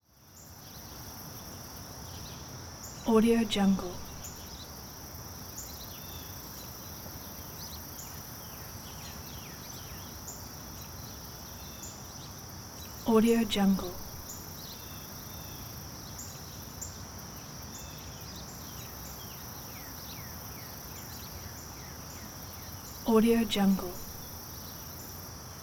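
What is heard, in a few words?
ambience subdivision; prosoundfx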